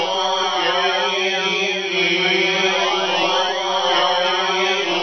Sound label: spooky vocal loop